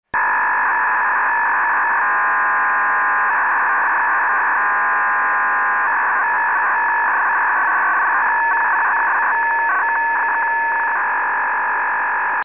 ao40 samp

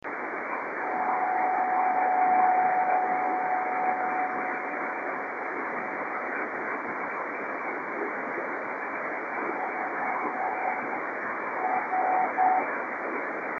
28180KHz

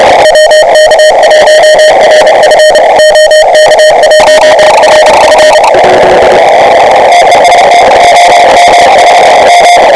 7MHz

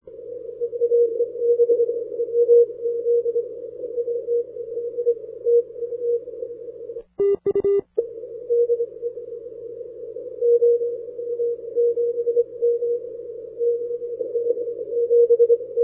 80m CW; 80m DXCC Entities worked from 2002-2008

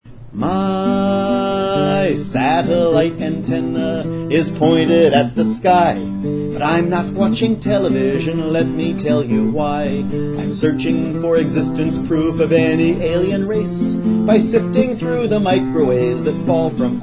anthem

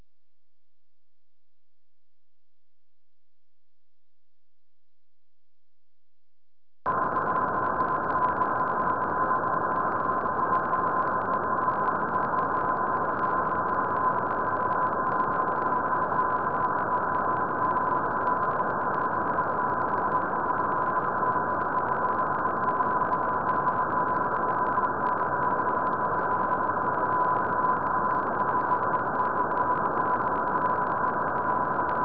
poltest 10 GHz -90deg; DK0SB; OK1KIR; OK1VAO

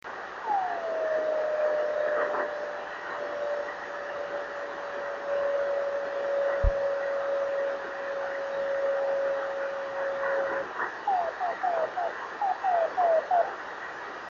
28416KHz

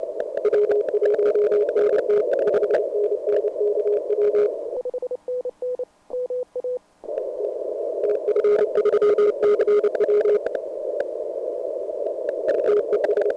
80m cw; TF3CW; iceland; 2009 arrl cw

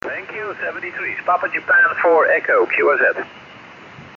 50.103 MHz, ham radio; PJ4E Island of Bonaire, Netherlands Antilles

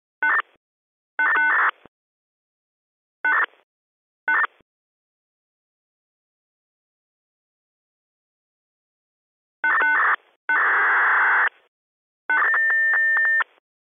Autocab

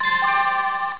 bing bong

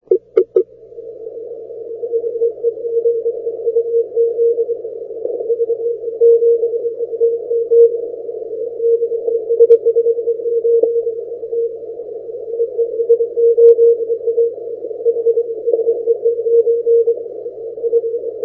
80m cw; VE7UZ QSO's with Slovenia.; S58A

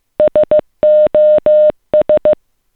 sos

